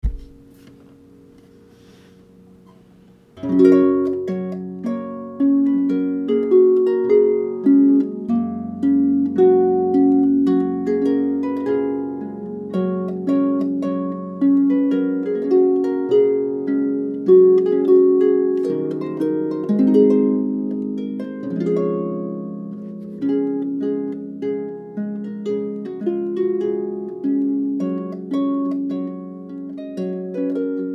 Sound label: Trumpet Tune